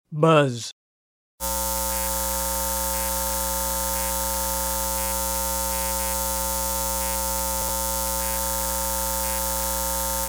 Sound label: buzz